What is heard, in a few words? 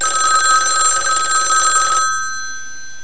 ring; dewars